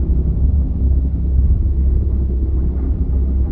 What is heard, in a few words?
perdition beam windup; apocalypse